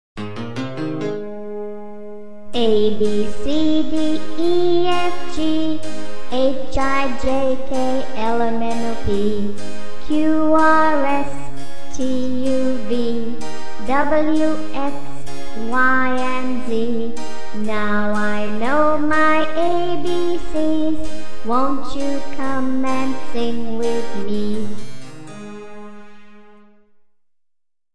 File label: abc song